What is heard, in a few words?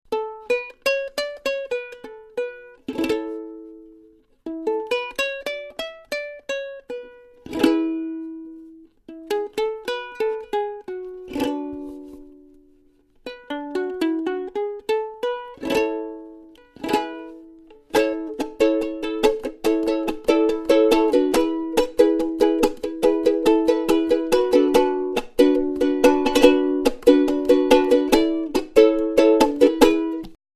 ukulele